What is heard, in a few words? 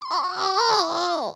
need to pee again